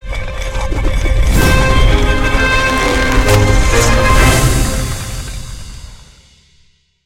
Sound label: opening Scatter Mechanism; lost relics